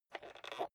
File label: rotary control general creak